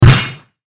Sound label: Hit Harsh Chime; Sound Effects:Sound Design; Blastwave